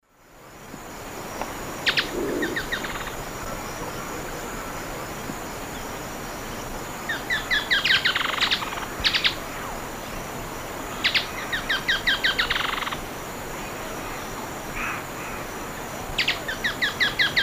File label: Cranioleuca vulpecula, song and calls; S. America; Eastern Lowlands